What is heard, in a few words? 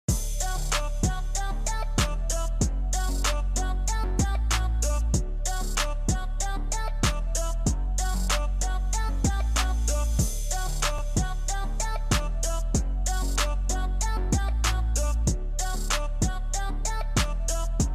Marche